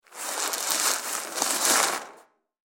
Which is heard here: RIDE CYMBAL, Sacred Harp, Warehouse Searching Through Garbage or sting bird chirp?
Warehouse Searching Through Garbage